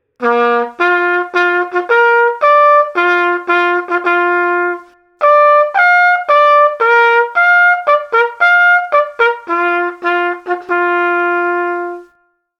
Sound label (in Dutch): trombone tuba trompet hoorn